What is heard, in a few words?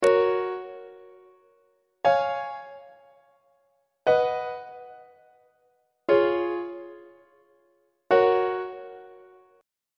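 F sharp major inverted imperfect authentic cadence on treble clef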